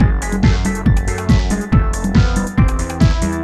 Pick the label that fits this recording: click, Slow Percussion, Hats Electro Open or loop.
loop